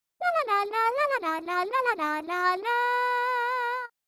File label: nokia tune